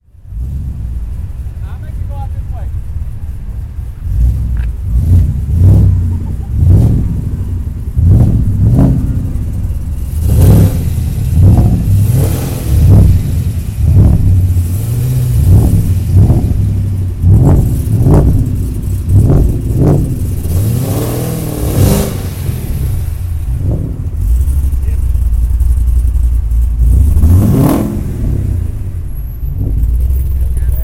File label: Camaro Mustang